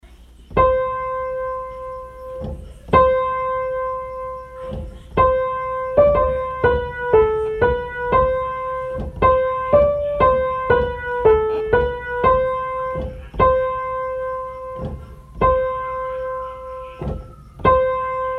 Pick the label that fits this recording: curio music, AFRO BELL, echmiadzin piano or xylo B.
echmiadzin piano